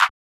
clap; drumkits; fl studio; maily plugnb

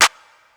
Clap Dirty; drumkits; Claps & Snaps; fl studio; young kico drumkit; Chronicles Of The Atlantic Trap (Sound Kit)

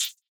hh, Hats; drumkits; rain puddles; fl studio; goodtakimu drumkit

